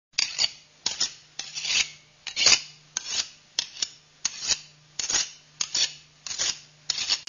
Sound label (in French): Couteau aiguiser; bruitage; Armes